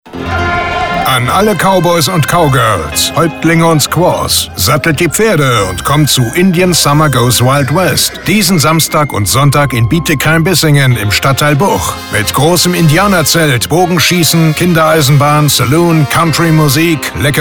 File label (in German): Indian Summer Musik; deutscher Werbesprecher; Hofmeister